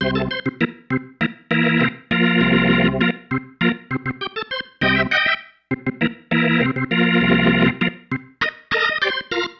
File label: Pattern, Organ